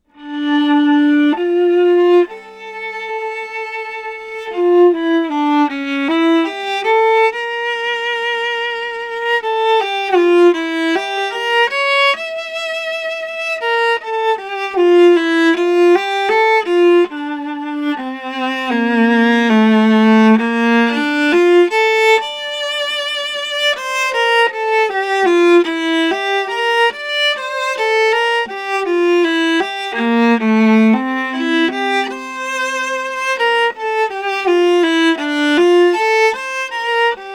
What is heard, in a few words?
viola